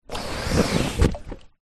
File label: boat rubber dinghy small float side rub on object